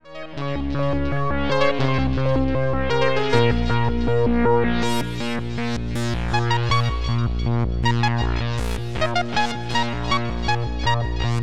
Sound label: 303 step sequencer